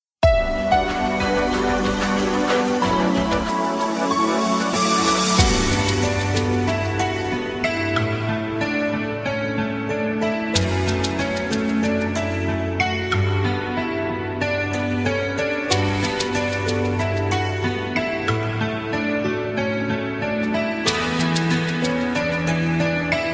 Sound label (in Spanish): Opening Sin voz; Kanon